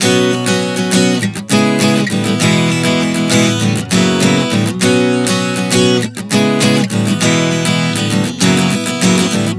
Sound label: Progression 100 B-C#m7-E-F#11, Acoustic Guitar, Chord Progressions 100 bpm